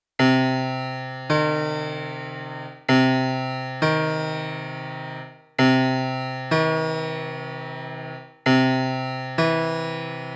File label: thirds